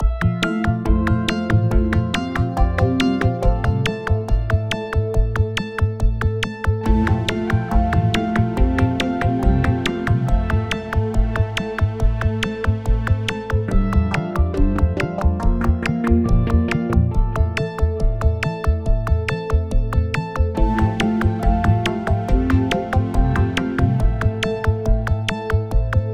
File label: Arpegio